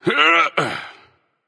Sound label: grunt lift; smite; voicepack; merlin